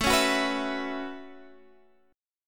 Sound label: B 7th Suspended 2nd Suspended 4th A